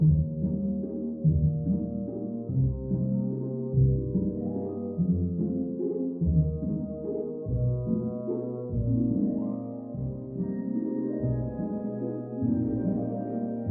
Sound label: Dance Music; Barbie Dreamhouse Adventures; Android